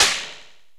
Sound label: ELECT PERC; OMI - Universe of Sounds